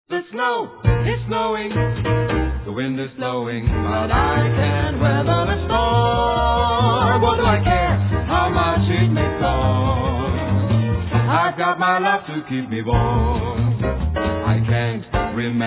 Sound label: heartbeat